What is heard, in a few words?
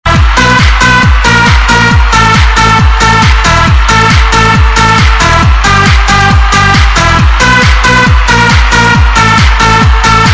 trance music